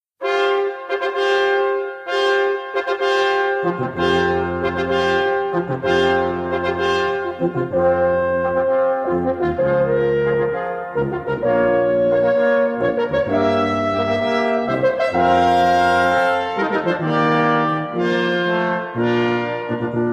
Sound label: Fanfare for Brasquintett